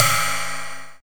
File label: CHINA RIDE; Greytsounds VOL-1; SDX HATS+CYM